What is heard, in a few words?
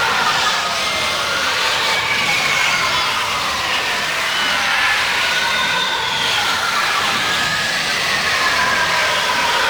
Absorb Ability Loop; Warframe; Infested; Zealoid Prelate